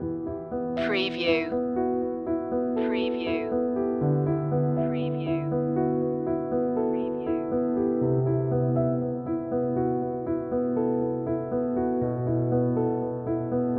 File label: frozen memories loop